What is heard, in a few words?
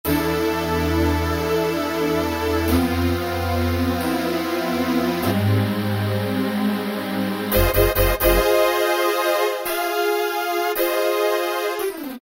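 HISS POWER, Kurzweil K2000, HYBRID SYNTH; Kurzweil K2000 Contemporary ROM; Contemporary ROM Programs and Demos diskette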